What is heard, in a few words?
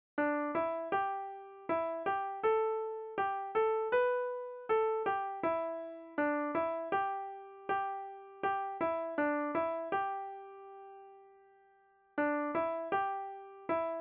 hymn melody